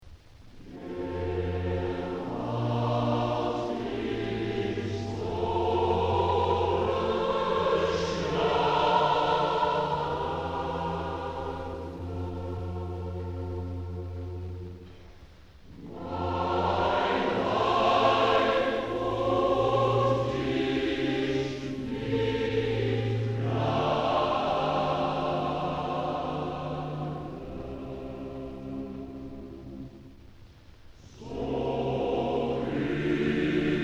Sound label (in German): choral; Bach, Johann Sebastian; Matthäus Passion; wer hat dich so geschlagen